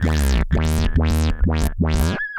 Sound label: Roland F Line, Roland S100; musicradar-synth-samples